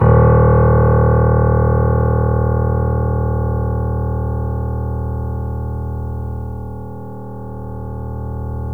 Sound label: D#, Piano; InVision Interactive; The Piano